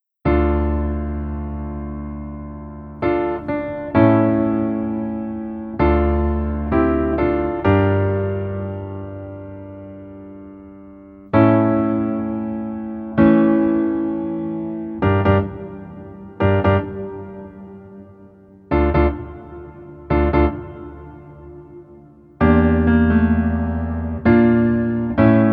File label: Piano